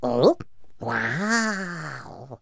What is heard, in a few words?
AMB China 3D Amb; rabbids multiverse; iOS, macOS, Mobile